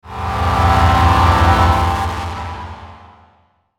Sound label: Musical Static Swell; Static Sound Effects; Blastwave